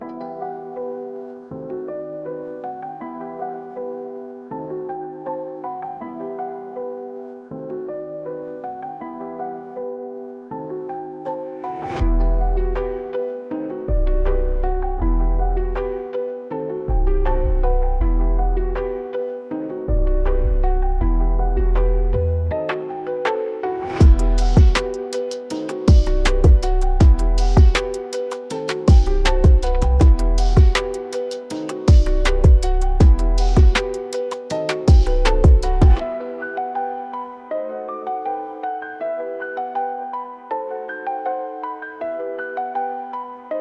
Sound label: 808s